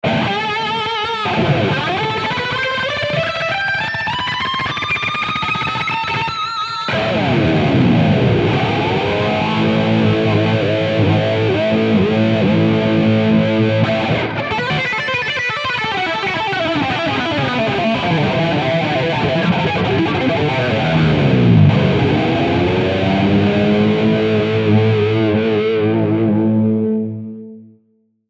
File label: X-VIBE PHAZER; xgear